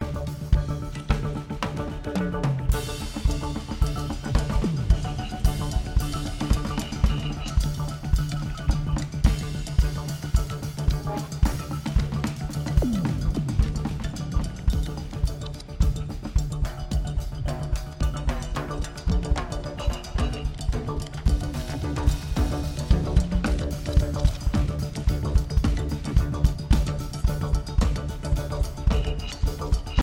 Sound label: Drums